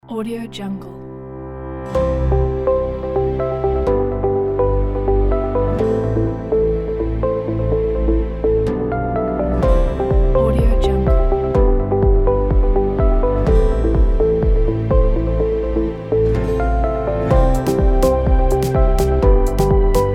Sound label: motivational ambient corporate pack